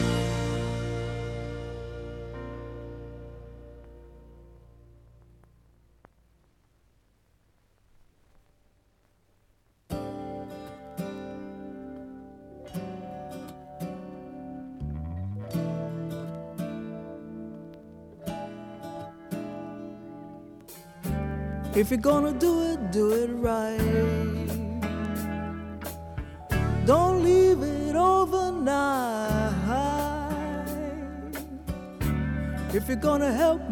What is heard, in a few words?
after decrackle; Next stage: after decrackling